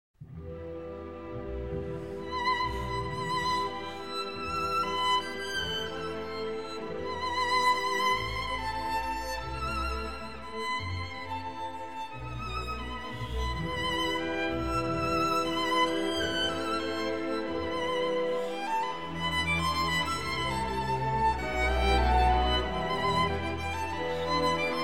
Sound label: Violin Concerto in E Minor I. Allegro Molto Appassionato